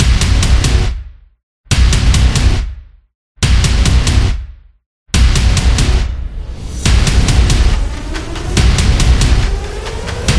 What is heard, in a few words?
Epic Power Teaser, epic music; epic music vol 2